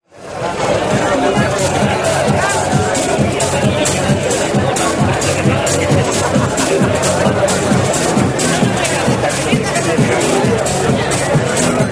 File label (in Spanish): bar de copas gente y música